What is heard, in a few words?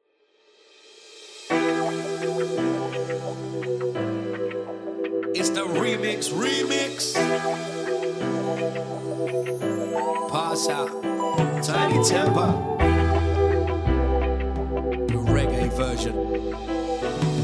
REGGAE